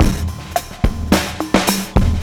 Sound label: Boom Boom 107 bpm, breaks; Zero-G Beneath the Planet of the Breaks